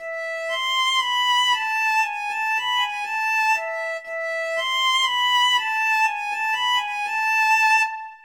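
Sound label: violin; Smeshariki Chiko's Computer; Skripka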